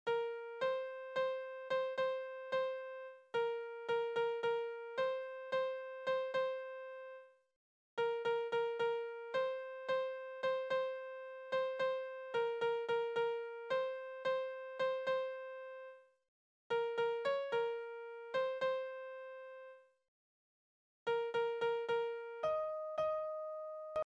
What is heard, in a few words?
melody; the old man down